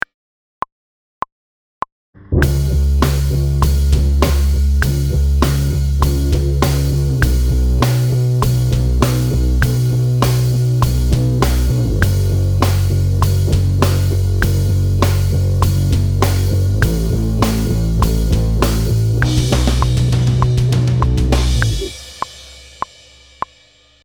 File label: no guitar